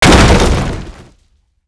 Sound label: Mine Fence